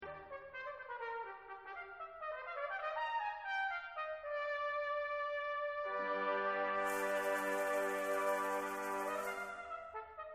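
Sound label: fireworks, Brass Band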